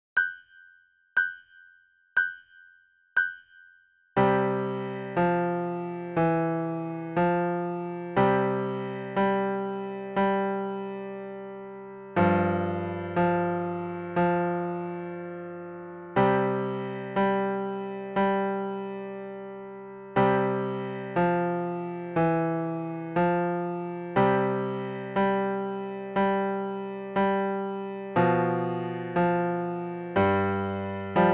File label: Mary Had Duet Slo